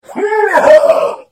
Hit; The Elder Scrolls III - Morrowind

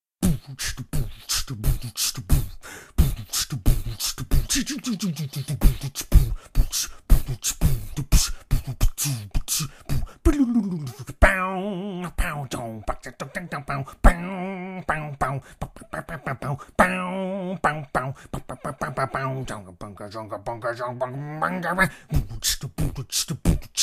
battle music; SyrinScape; Syrinscape unplugged; Jungleplanet dinolaser battle

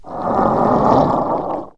moan; The Elder Scrolls III - Morrowind

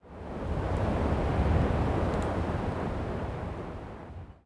wind CALM; The Elder Scrolls III - Morrowind; Morrowind GOTY GOG

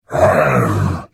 Hit; The Elder Scrolls III - Morrowind

